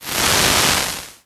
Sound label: Burned to Ashes